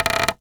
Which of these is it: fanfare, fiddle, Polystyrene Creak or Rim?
Polystyrene Creak